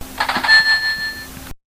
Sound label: Wiimote Sound; Sonic